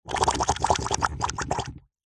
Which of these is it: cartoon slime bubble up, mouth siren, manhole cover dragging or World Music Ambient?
cartoon slime bubble up